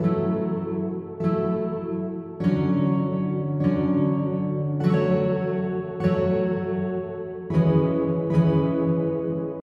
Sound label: Guitar Temp Layer